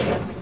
starter stop; rigs of rods

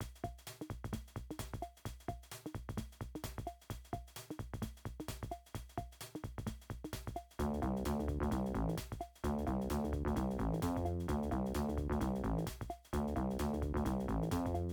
funky; commander genius

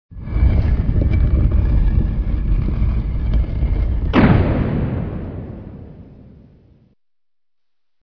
stone door; roomforchange

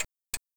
turn signal; rigs of rods

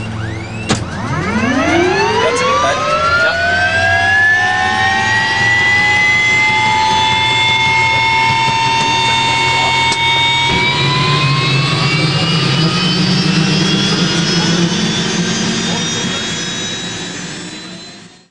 turboprop start; rigs of rods

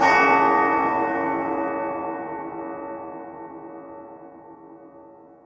bell; edgar

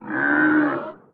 tauroch hit; wesnoth; Under the Burning Suns

